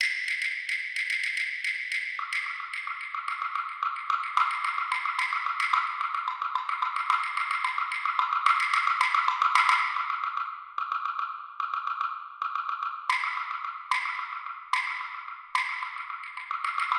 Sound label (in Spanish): Ejercicio de Compo Percu